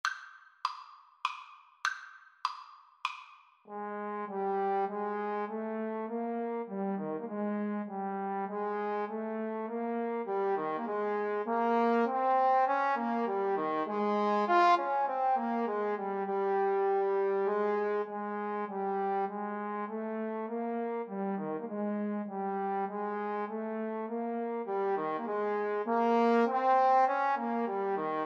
Trombone Duet

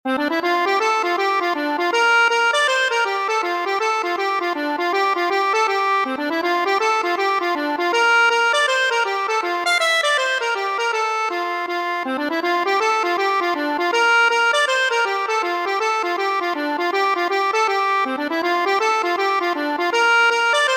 accordion

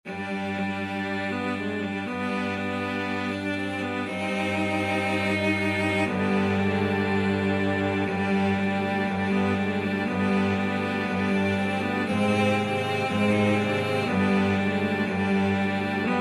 hava nagila, cello ensemble

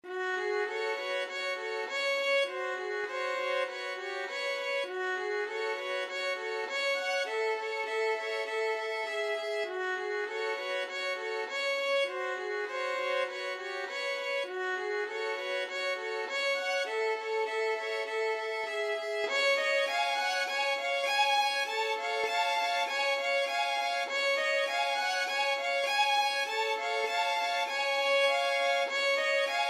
violin trio; grieg mountain king

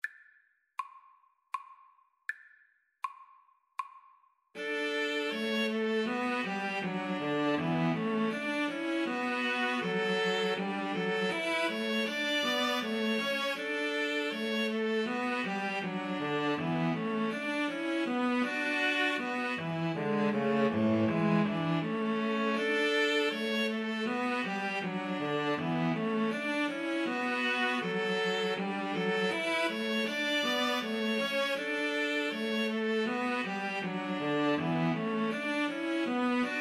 hornpipe, String trio

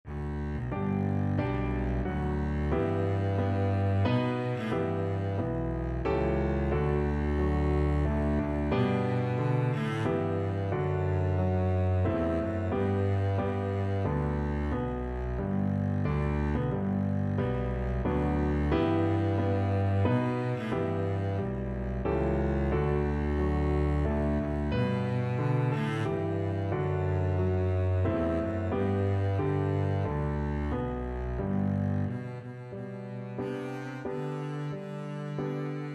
star spangled banner double bass

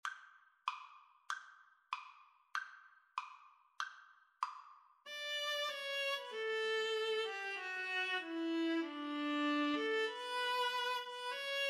joy to the world, Viola-Cello Duet

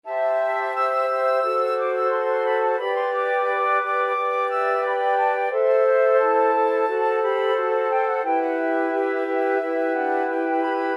polovtsian, flute choir

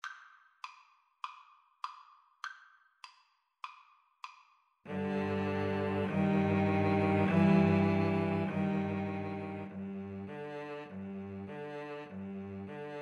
Viola-Cello Duet

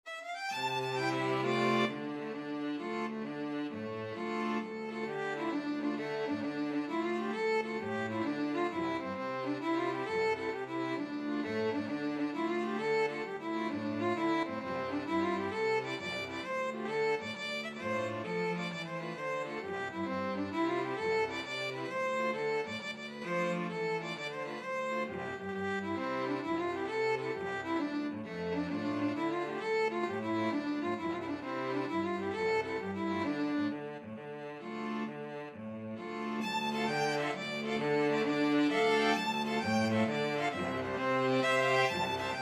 string quartet

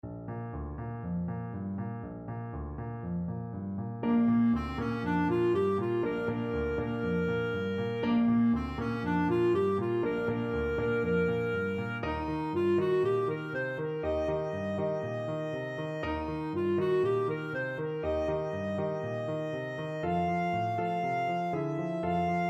clarinet; carouselle

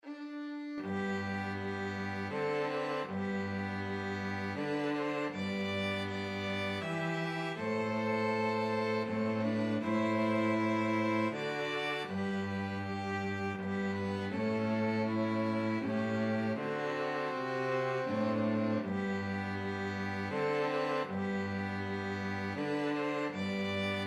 away in a manger, 2 violins cello

